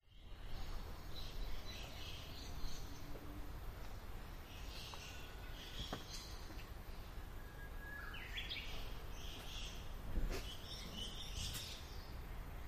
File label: twitter, animal